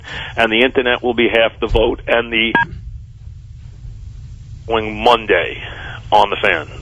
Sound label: BEEP; WFAN